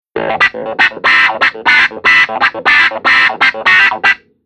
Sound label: Keyboard Clavi